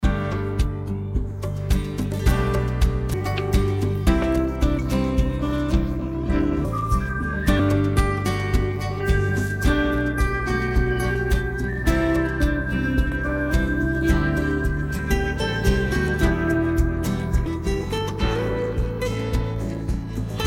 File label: Happy Theme Guitar Slow